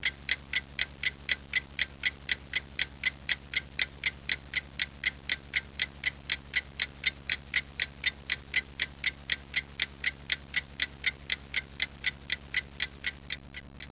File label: Tick; WAV Sound Effects; houshold; clokphon